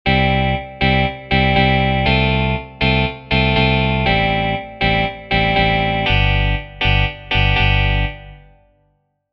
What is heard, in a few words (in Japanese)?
ギターとベース; タブ譜のコピー